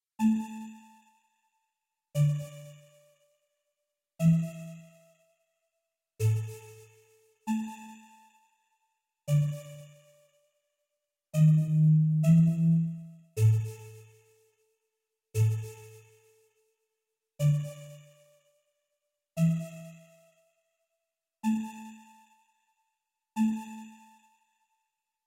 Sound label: birthday distant